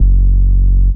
FK BS F#, FUNK; USB Soundscan vol.47; AKAI; Hip-Hop & R&B

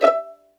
E5 PIZZ, Violin; Q Up Arts; Denny Jaeger Master Violin Library; PERFORMANCE

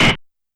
Snare Noise Dist, YAMAHA RM1X

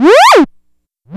Wow, synth one shot; Sonic Foundry (Sony Creative Software); Electronic Point Blank

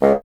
WND BSN, Bassoon

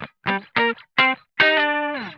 GLIDE, Funk Guitar; Zero G - Funk Guitar

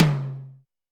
Tom Amb Hi Clear, Alesis D4

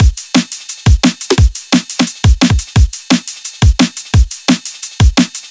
Drum Loops, 174; M8; Electronisounds

